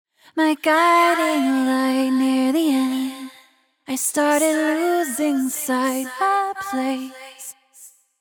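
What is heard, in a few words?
Vocals Background Vocal Room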